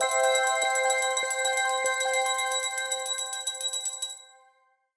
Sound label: jackpot; Skillzgaming; gems odyssey